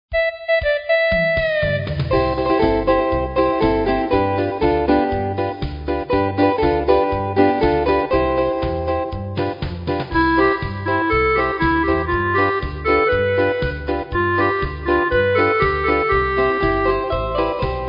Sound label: Polka